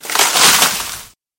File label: monster ent